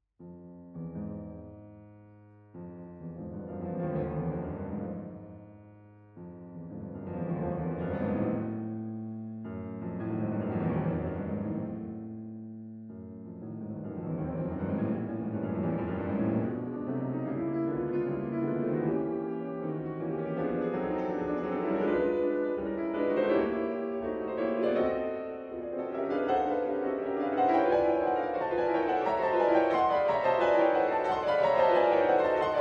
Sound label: prelude